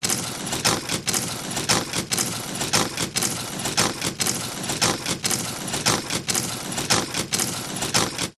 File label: industrial machine mechanical clunk whir repeating process operate conveyor etc